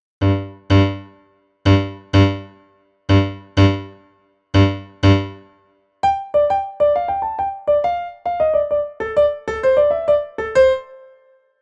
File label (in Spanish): Melodia con el ritmo